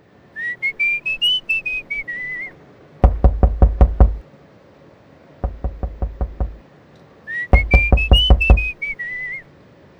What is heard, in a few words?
soundscape